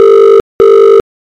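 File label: British ringing tone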